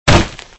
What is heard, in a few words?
bone impact